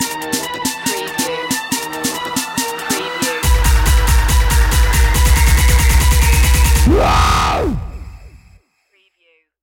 dubstep stinger

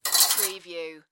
Croquet Wickets Cart

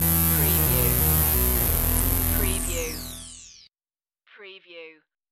Hip Hop Synth Licks 90 BPM E Swing

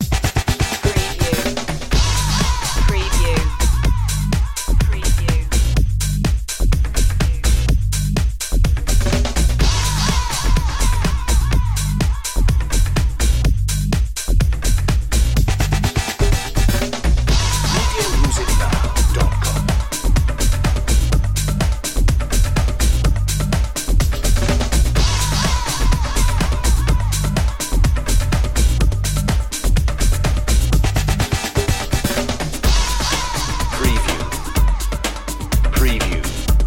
jump start

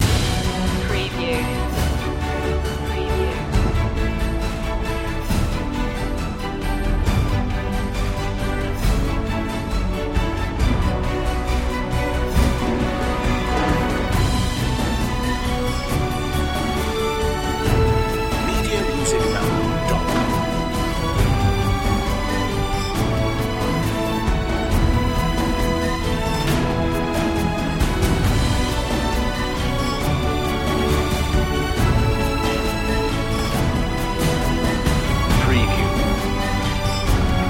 no choir

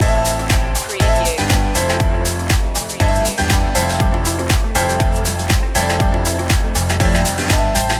disco chic loop

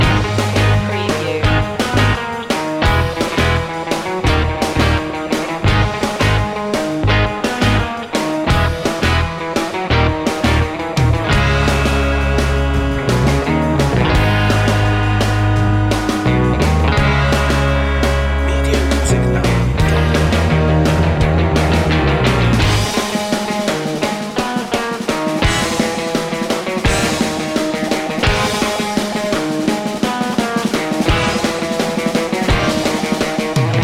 surf loop

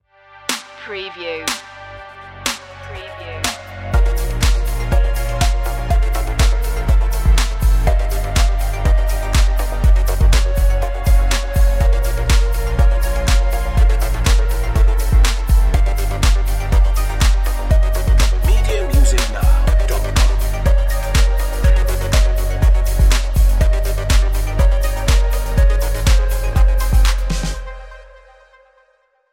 synth dance

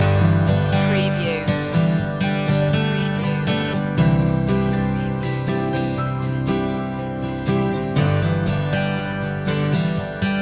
young and free loop

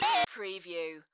Digital Signal Short Circuit Alert

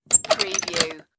spring lock

